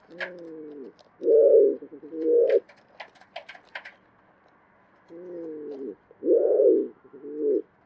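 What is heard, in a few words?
wood pigeon columba palumbus; vyahir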